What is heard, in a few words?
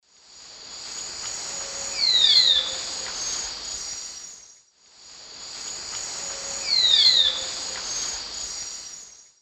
Pipra filicauda, call or song; S. America; Eastern Lowlands